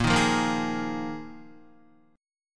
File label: Bb 7th Suspended 4th Bb down Guitar; strummed; JGuitar